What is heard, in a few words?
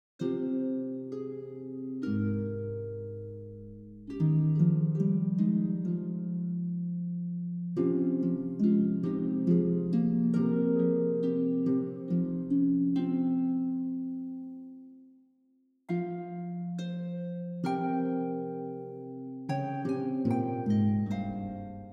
royalty free music; Legends in Music